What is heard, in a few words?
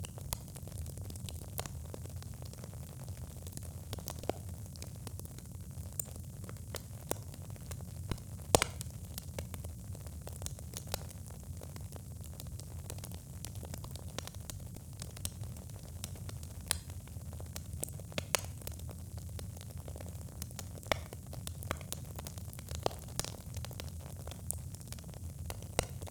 fireplace